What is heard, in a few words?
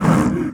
tiger struck; mir2; crystal